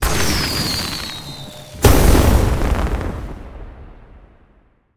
firework huge

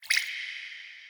atk fish heal

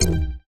popup hint

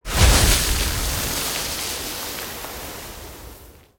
ship sand

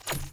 water ball

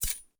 atk sword

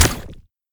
water ball

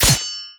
brighten sword

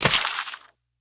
fan

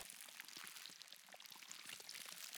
ui beverage loop

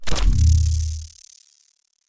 ui banner slide

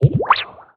shotgunxdouble

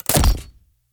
grenade

